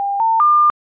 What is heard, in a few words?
ringtone